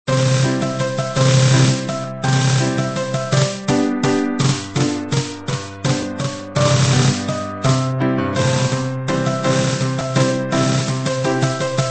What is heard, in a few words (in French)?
tambour et piano; wien